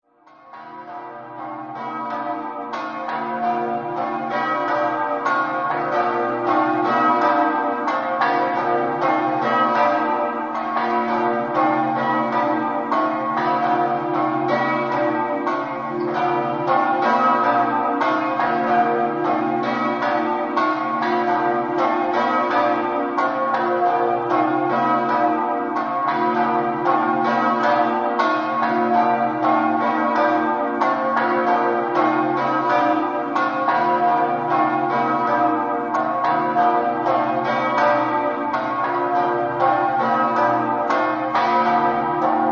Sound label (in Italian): campane, buia